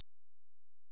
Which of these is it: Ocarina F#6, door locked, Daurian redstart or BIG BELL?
Ocarina F#6